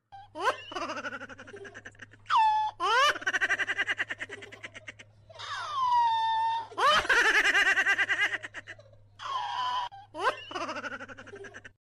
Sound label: LAUGHING KITTEN; cat